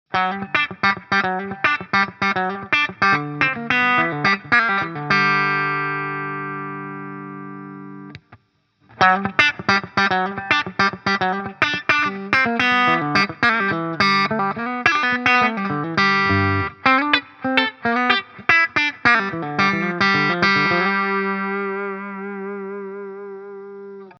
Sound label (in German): PRS Steg PU max. Kompression